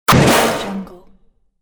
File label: metal punch noise impact